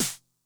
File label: Snare Drum, Low Tune Med Tone, 909; classic machines; 909mod